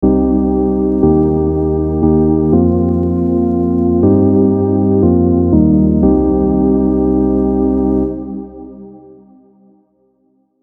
Minor Pentatonic Prog; Unison Minor Pentatonic Advanced Scales MIDI Collection

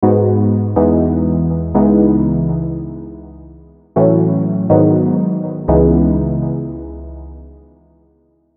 Minor Prog, MIDI Chord Progressions; Unison Essential Advanced MIDI Chord Progressions

